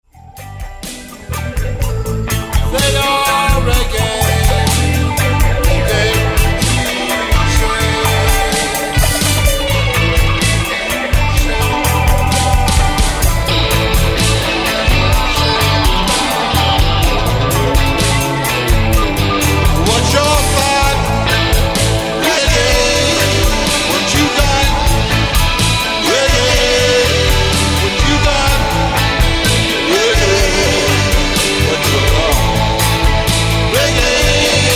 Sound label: Reggae Music